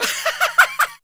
RIRE FEMME; East West - Global Sounds; SC-55 FXS

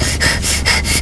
respire, FIGHTING; East West; Global Sounds

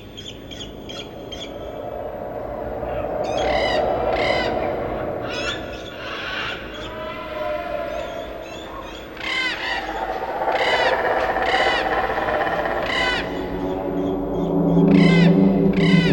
AMB FORET; USB Soundscan vol.02; Underground Hip Hop; AKAI; AMB.FX

